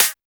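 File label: QUIRKY snare; Drums In Your FACE!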